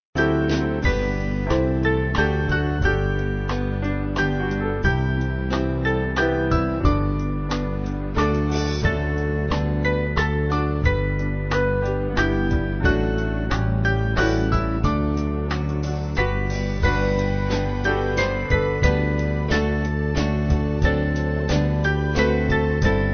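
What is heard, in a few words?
Band, Music Backing Files for Churches; Lowry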